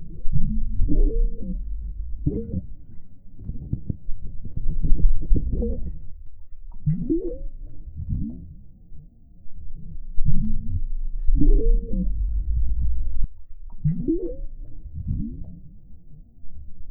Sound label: Underwater Ambience; Cruelty Squad missing unused soundtracks